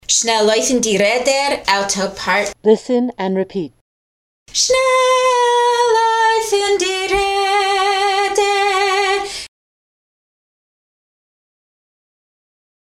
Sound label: alto drill; Shnel Loyfn